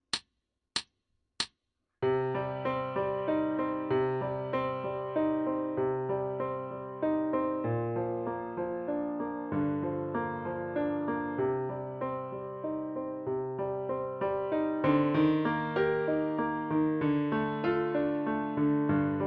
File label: Prelude-Full Tempo Accomp Only, Flute